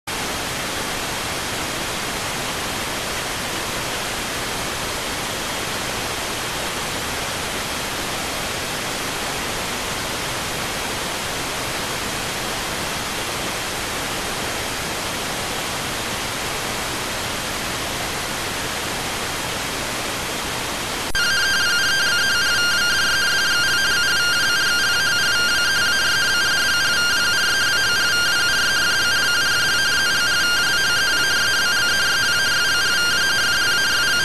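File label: radioteletype receive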